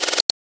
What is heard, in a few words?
hopper deploy; svencoop; hl2